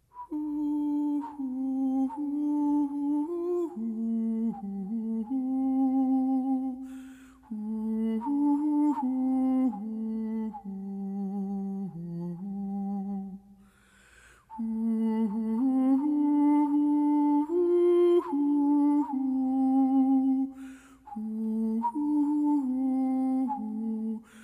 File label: partch lipo flute